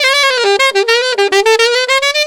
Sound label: Alto in G, Alto Sax; Sonic Foundry (Sony Creative Software)